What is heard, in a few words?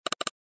Computer mouse double click; Mouse Clicks and Mouse Wheel Sounds